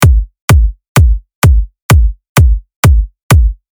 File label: Dubstep